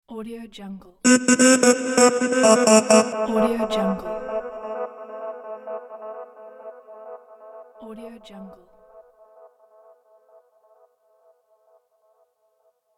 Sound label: male delayed vocal fx